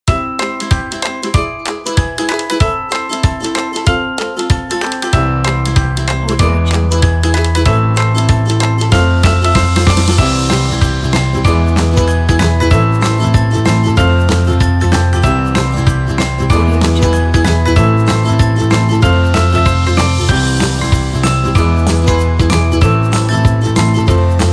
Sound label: happy funny kids music